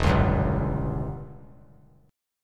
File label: E Minor 6th Add 9th chord; Em6add9 strummed; JGuitar